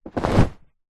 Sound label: clothing suit trousers pants drop; foley